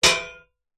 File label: medieval sword impact metal shield vibrate